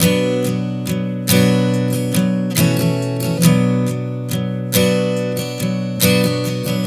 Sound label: Strum 070 Bm, One Chord Strumming 070 bpm; Sonic Foundry (Sony Creative Software); Songwriter Acoustic Guitar Companion